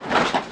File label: harpy stand